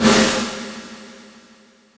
LongVrb, BossDR660 fx; drum machine

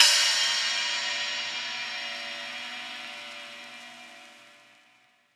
ride; eddyflux

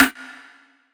snare; dough-samples; eddyflux

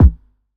kick; eddyflux; crate bd; dough-samples

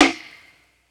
snare; dough-samples; eddyflux

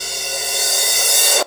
RevCym, BossDR660 fx; drum machine

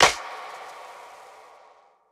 clap; eddyflux

